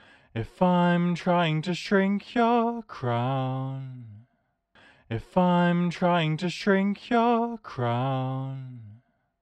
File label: warble original